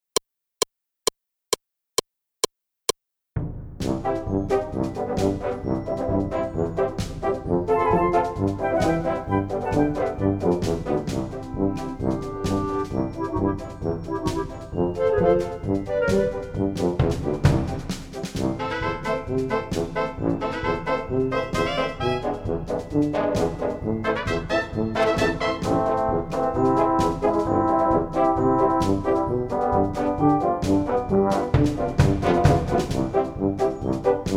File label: Piano